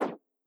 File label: statistics panel pop out